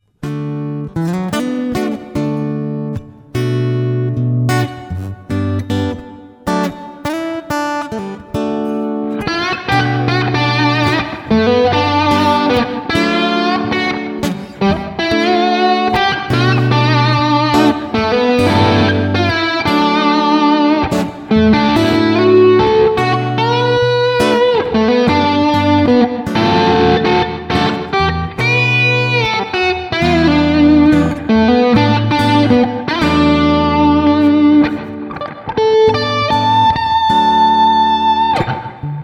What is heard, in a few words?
crunch n piezo, Guitar; Ed DeGenaro playing the FM Centauri combination of the Piezo for the clean straight into the board, with the bridge single coil